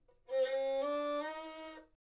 低音伬胡 演奏技法 连音

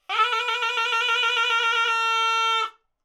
低音唢呐 演奏技法 打音 连打

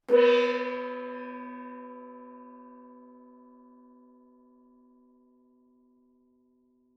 包锣 筒音 自然衰减 力度强

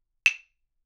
代子 筒音 自然衰减 力度中强

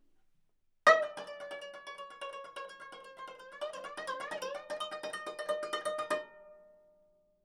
伽倻琴 演奏技法 华彩

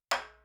中国大鼓 演奏技巧 双锤同击鼓梆

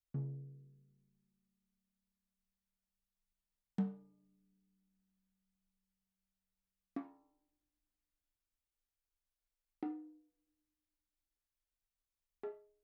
五音排鼓 筒音 自然衰减 力度弱

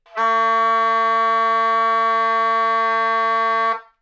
中音加键唢呐 筒音 自然衰减 力度弱

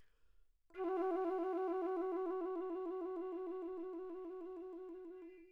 A调曲笛 演奏技法 指颤音

